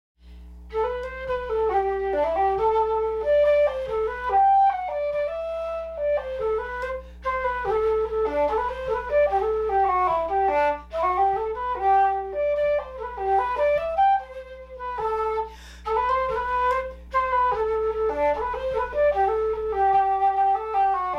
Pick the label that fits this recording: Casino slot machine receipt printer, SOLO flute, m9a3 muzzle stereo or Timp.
SOLO flute